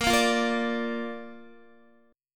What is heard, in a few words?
A sharp Major, Guitar; strummed; JGuitar